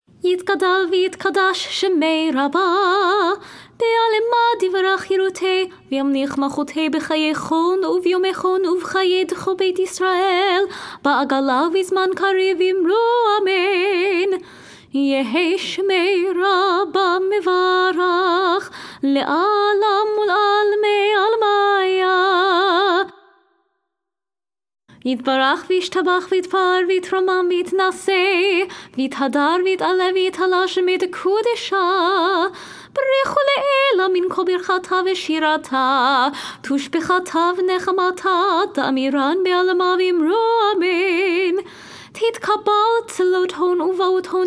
kaddish shalem major

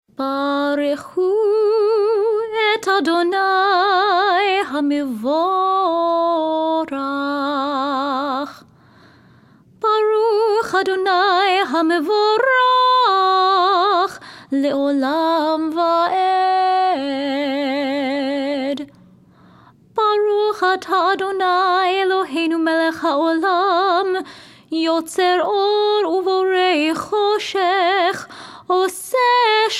barchu nusach minor

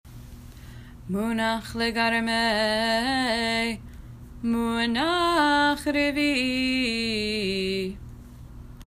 munach legarmeh munach revi i; 9. Munah Revi’i; Cantillation for Torah; The Jewish Theological Seminary

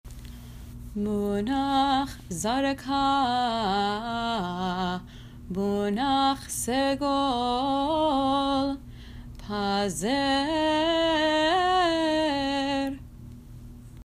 munach zarka munach segol pazer; 8. Munah Zarka Munah Segol Pazer; Cantillation for Haftarah; The Jewish Theological Seminary

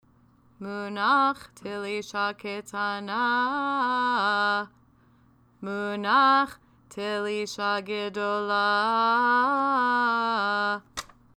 munach telisha ketanah munach telisha gedolah; Cantillation for Haftarah; The Jewish Theological Seminary; Munah Telisha-Ketanah Munah Telisha-Gedolah

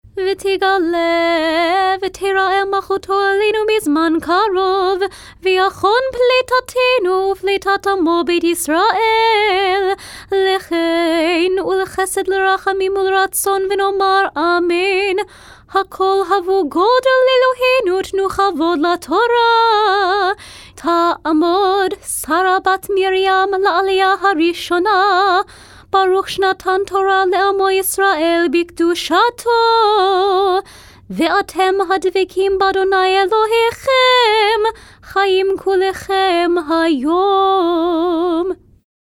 nusach major minor; v tigaleh

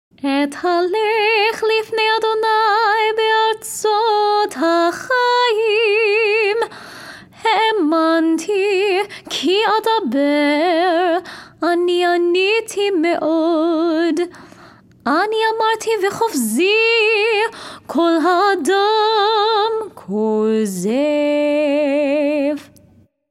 nusach ukrainian dorian; Psalm 116 v. 1-11 (Nusah Ukrainian Dorian); Festival Shaharit (Higher Voice)

